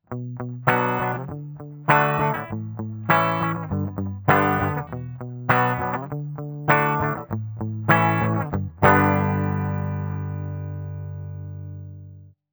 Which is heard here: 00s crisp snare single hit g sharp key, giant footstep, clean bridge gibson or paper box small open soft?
clean bridge gibson